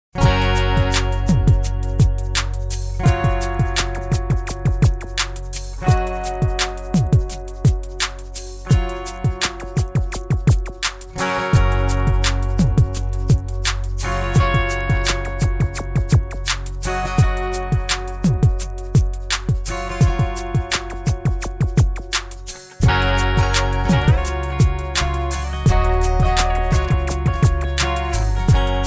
Samsung Stylish Tone

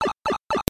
Pipe; Mario64

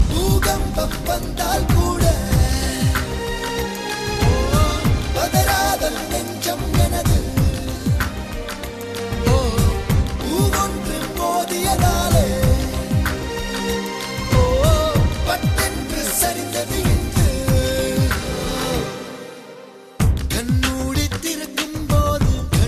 Tone

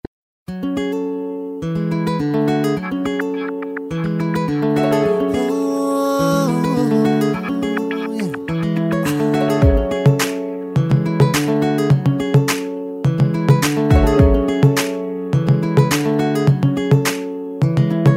Moody Beats

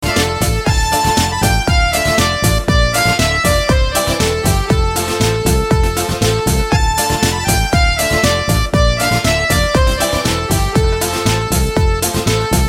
Music

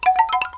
Notification

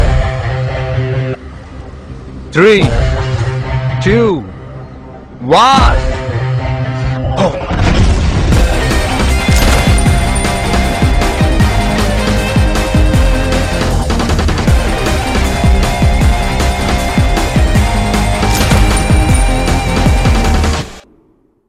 Boom; 3 2 1; meloboom

